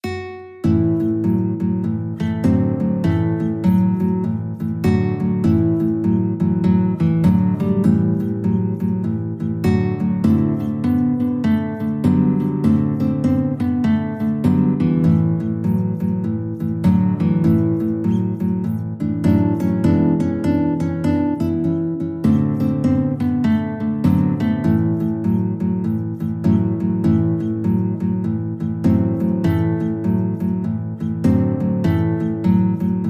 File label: cc rider blues, guitar ensemble